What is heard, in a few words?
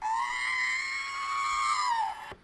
fail; soundboard